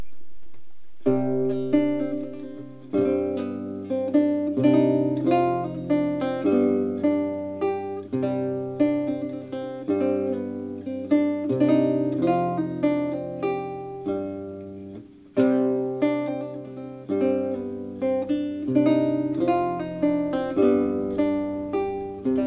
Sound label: menuet